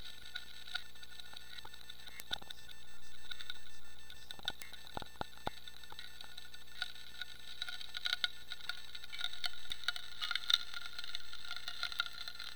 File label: crackling